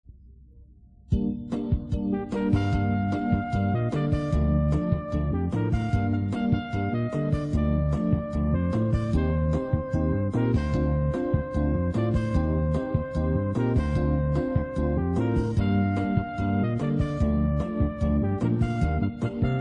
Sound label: Cool Jazz